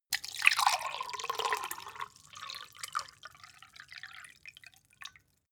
glass pour ring; soundpool

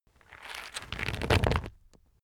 nut crunch; haus garten; kueche

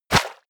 step walk water; schritte

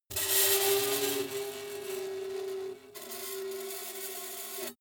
metal screech phaser special

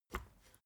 step ladder wood single

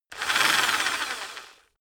rock drill rev low medium

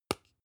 spice jar small open short

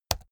keyboard laptop key press fast hard

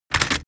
metal buckle handling

